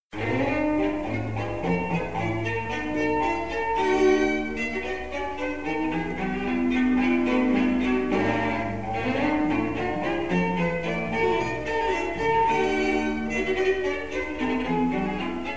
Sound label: trio; sinigaglia